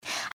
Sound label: breath; pony; Twilight Sparkle